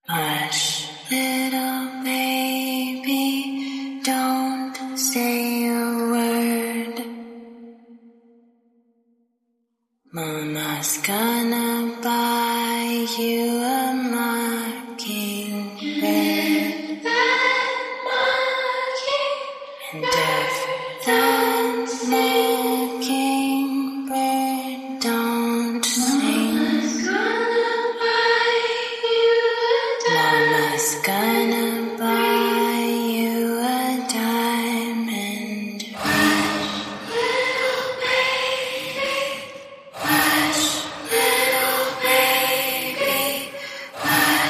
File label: Hush Little Baby Horror, Vocals Only